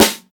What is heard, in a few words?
crisp snare drum a key, Royality free snare drum sound tuned to the A note